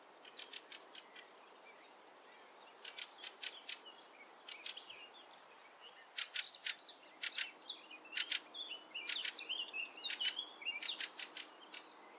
drozd kvíčala Turdus pilaris

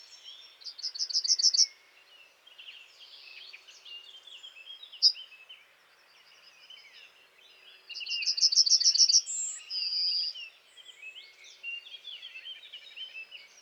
strnad obecný

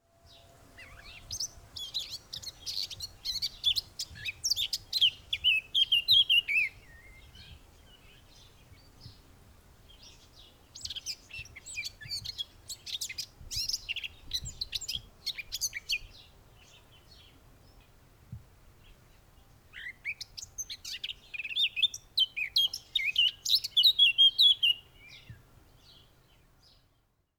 pěnice černohlavá Sylvia atricapilla pauluccii

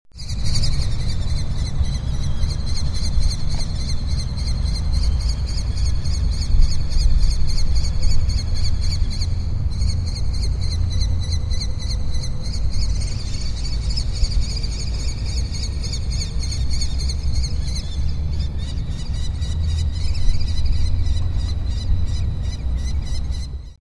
postolka obecna

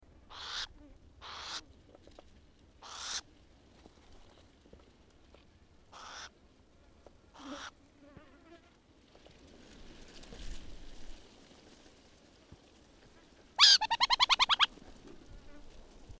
sýček králičí Athene cunicularia rostrata

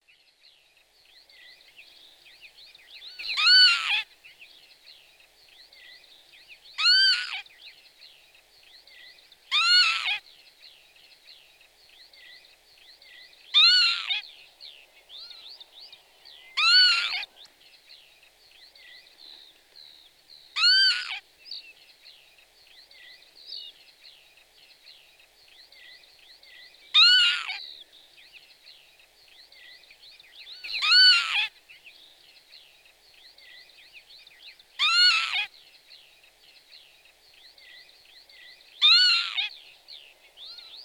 cejka chocholata

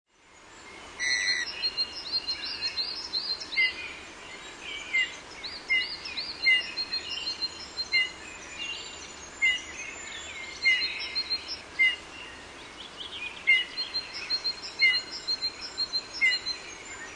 hýl obecný

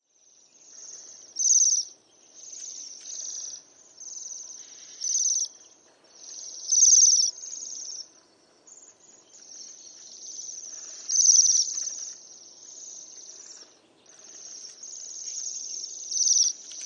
brkoslav severni

